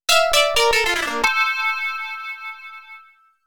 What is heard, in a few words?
Coin In; Taito Digital Sound Archives Arcade Vol.2